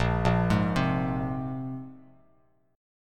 A sharp 7th Suspended 2nd, Bass Standard; arpeggiated; JGuitar

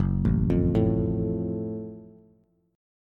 F sharp Minor Major 7th F sharp Bass

